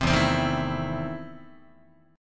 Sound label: E Major 7th Suspended 4th Sharp 5th, Guitar